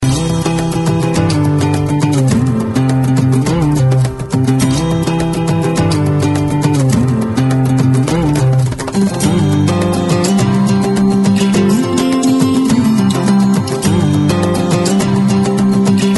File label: Carnatic Love Tone